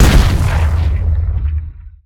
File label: Seismic Blast Fist; Evolve; Behemoth